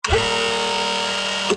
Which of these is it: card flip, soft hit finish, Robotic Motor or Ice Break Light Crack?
Robotic Motor